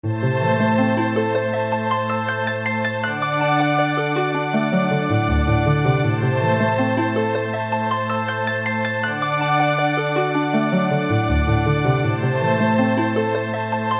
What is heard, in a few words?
Gentle Harp